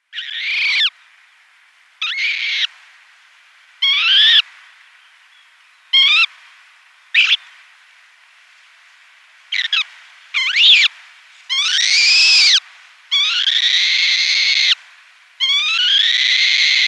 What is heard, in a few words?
Little Auk downy Young